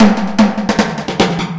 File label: Roto Toms; Drums; Fills; Misc Fills